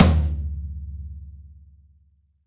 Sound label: Rock Tom, Roland BK-5